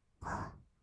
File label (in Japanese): 吐く音, ブレス, kawaii voice; ASMR